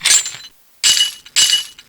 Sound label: default idea; blackquill